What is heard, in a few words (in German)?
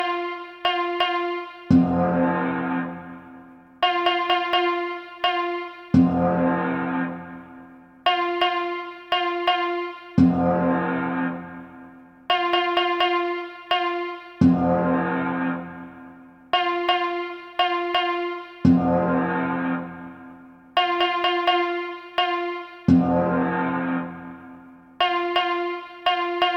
Gongspiel